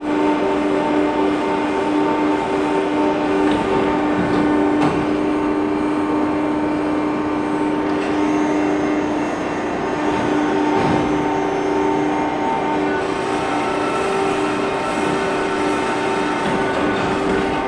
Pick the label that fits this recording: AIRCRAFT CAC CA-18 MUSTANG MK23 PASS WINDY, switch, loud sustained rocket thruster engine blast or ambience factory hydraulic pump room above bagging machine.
ambience factory hydraulic pump room above bagging machine